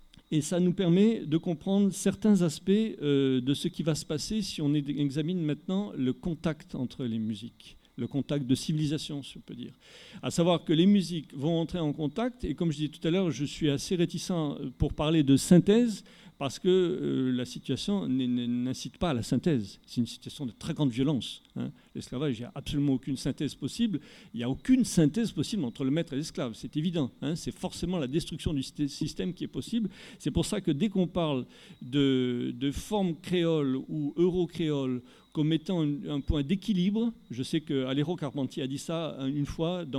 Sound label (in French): Une synthese musicale impossible